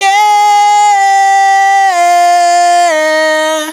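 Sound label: riff Yeah Long F minor, Vocals, Dry Vocals; Raw Vocals; Slate Digital Omnivox Vocal Sample Pack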